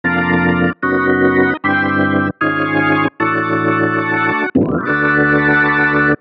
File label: Music Baseball